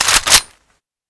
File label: car bolt, weapons; deluge